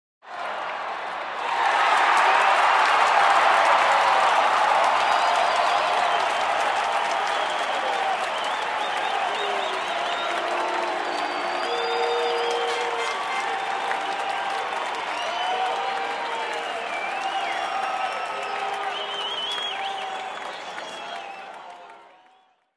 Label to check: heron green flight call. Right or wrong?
Wrong